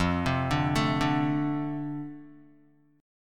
F 6th F down Guitar, chord; F6 arpeggiated

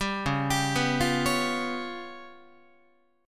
Db 7th Flat 5th Guitar, Db7b5 chord; Db7b5 arpeggiated; JGuitar

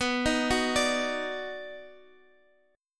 Eb Minor Major 7th Sharp 5th B, chord

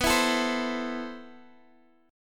C 6th B, chord; strummed

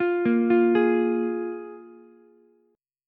F Minor Double Flat 5th F Ukulele; Fmbb5 arpeggiated; JGuitar

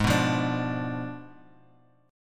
G sharp Diminished 7th G sharp, Bass Standard; G#dim7 strummed; JGuitar

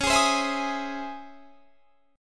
Db Minor Major 9th Db, chord; strummed; DbmM9; JGuitar

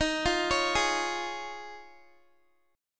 Eb 7th Suspended 2nd Suspended 4th Eb, Guitar; Eb7sus2sus4; arpeggiated

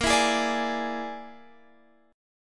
A sharp 7th Flat 5th A sharp, Guitar Standard; strummed; x,13,14,13,15,16; chord; JGuitar

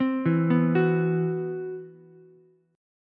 C Major Sharp 11th, Ukulele C6; CM#11 arpeggiated; JGuitar

